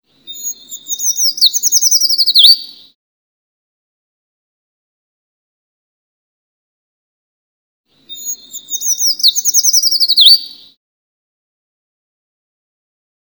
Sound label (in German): Vogelstimmenspiel Waldbaumläufer